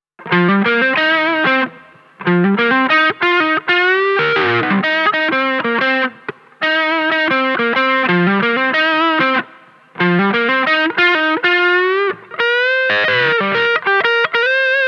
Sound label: crunch reverb epiphone casino; roland cube lite